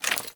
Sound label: Generic Footstep; Warframe; Dagath